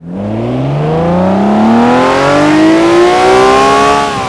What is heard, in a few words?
first, vehicles; gmod; tdmcars; reventon